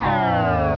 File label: energy